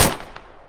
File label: pistol sound effect